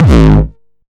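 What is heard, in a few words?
909 distorted resonant - G#1, kicks; Mbase 11 Kicks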